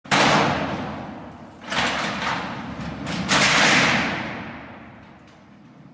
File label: all gates